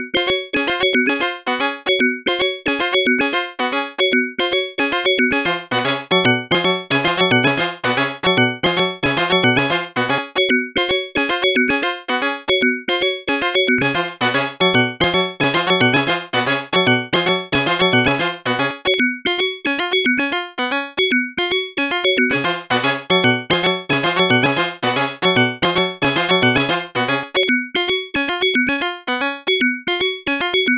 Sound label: Rossum Electro Music Panharmonium as Oscillator Add Voices